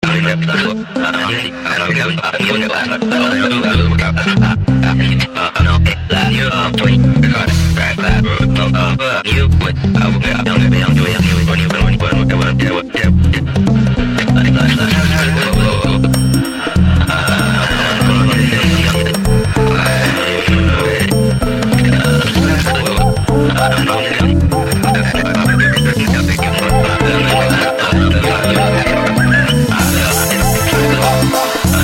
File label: instrumental